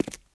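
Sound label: boot wood walk left, footsteps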